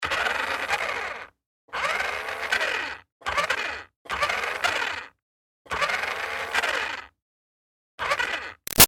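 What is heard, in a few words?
Toy Bounce Back Racer Close Up Revs Med, toy car; Toys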